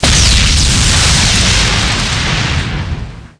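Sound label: explosion large; MAXDEMO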